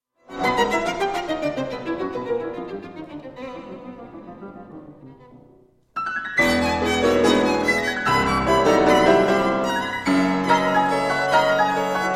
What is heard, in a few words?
theme and variations for violin and piano; olivier messiaen